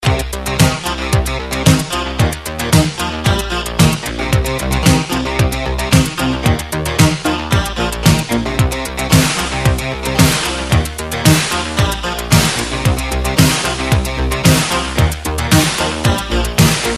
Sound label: Disco Band